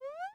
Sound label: hit